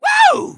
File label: woo